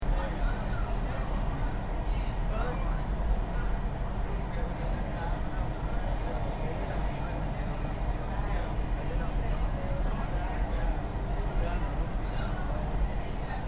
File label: Subway Interior Distant Walla Announcement Page; LYN107 package of Urban Spaces